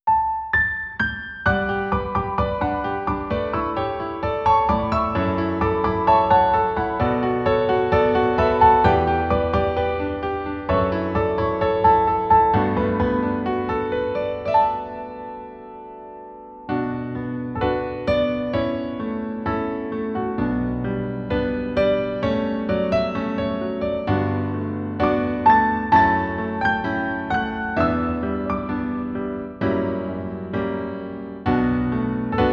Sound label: you never know (piano arrange); nurse love syndrome